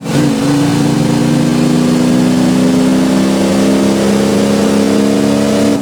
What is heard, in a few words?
third, vehicles cars; hummer h1; lwcars